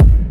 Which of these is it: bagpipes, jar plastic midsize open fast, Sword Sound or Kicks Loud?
Kicks Loud